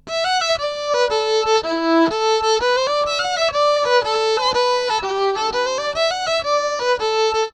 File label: violin; gcompris-qt